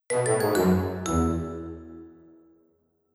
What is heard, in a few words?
for level completed